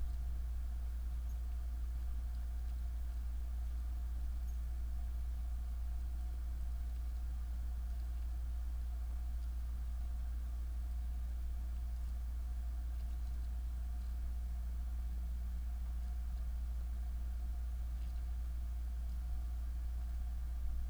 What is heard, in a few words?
room tone